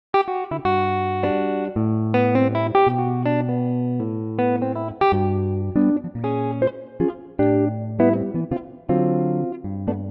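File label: G electric guitar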